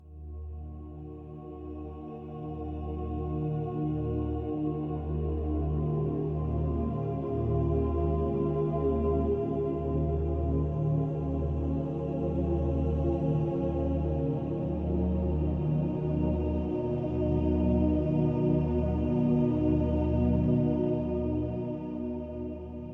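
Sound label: Relaxing 432hz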